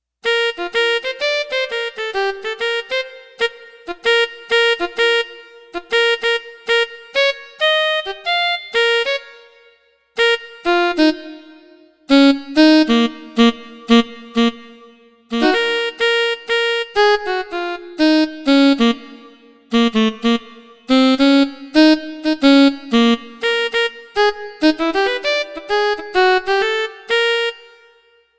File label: Alto Sax